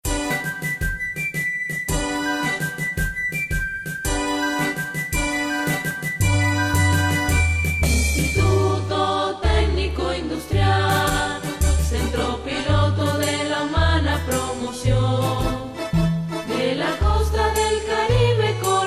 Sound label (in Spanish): himno; Itida